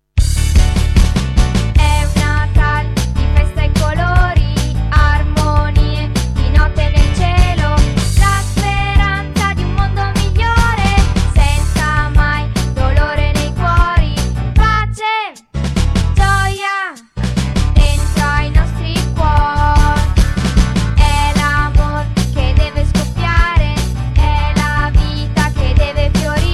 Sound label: canto